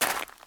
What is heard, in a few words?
gravel walk